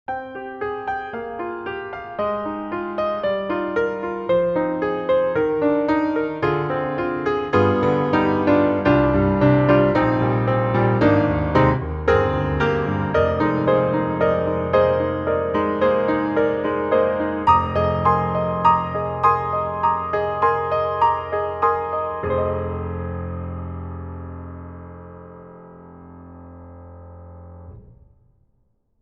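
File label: Steinway D, Close, piano; Embertone; Walker 1955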